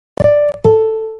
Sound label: phonepe payment sound notification